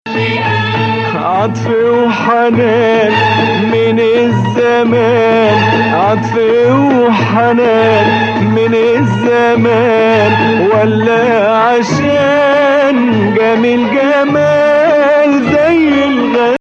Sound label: Nawa Athar Gameel Hijazkar